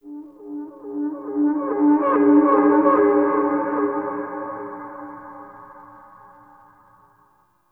Dark Wind Blow; Tau

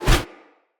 Husk Whoosh, Unarmed; Weapons; Enemy; Mockery Husk

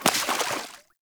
Short Sword Medium Impact Water; Weapon Impacts; Player; Swords; Environment

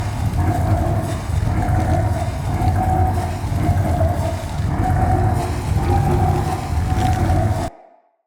Pull Beam Loop; Encounters; Collector

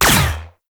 Shot; Warframe; Weapons; Supra Vandal

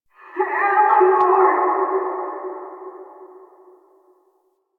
Exertions; Good End; Lettie; Warframe; Hive Queen; Cinematics

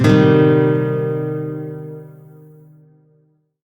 Shawzin Pent Min Chord D; Music; Warframe; Lotus Shawzin

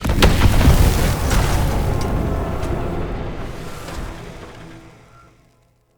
Furnace Bomb Explo; Encounters; Ode Pollution Tower

